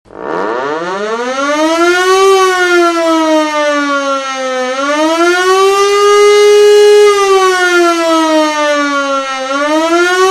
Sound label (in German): Sirene KAT